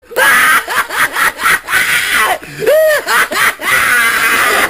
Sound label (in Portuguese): rindo